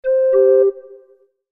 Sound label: Chime Musical, Chime Musical Multimedia